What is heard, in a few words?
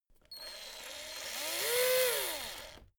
servo move beep